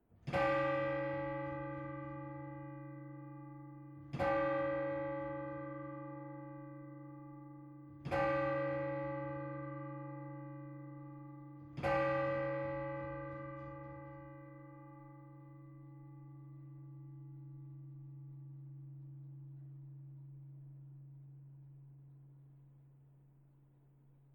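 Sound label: bell Uhrschälle; southhelm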